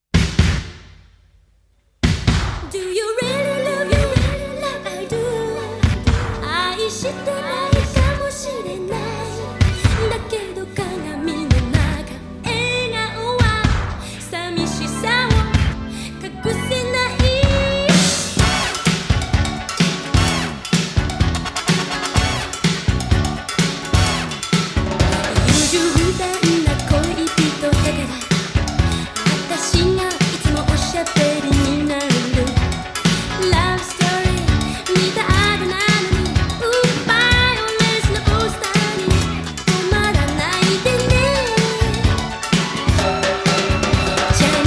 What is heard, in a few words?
Female J Pop Boogie Funk